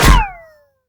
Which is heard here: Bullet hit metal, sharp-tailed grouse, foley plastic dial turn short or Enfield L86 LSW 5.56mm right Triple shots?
Bullet hit metal